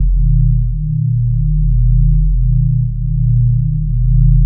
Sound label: tractor